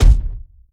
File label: Climb Base; Evolve; Goliath